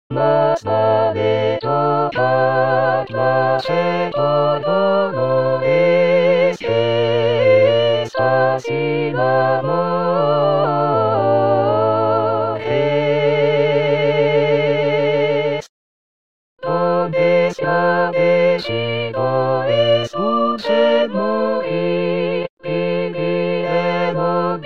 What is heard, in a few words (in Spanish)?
TODOS voz; masvaletrocar; Coro "Con dos Bemoles"